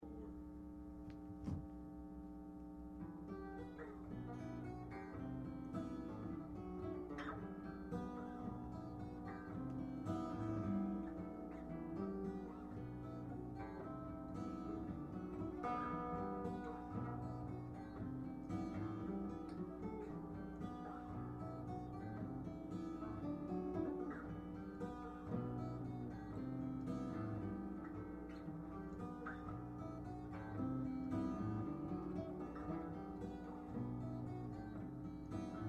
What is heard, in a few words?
acoustic